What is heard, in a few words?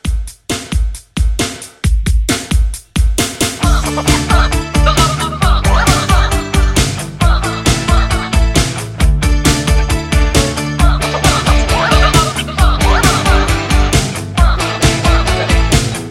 80s Rock Scratch Re Drum 134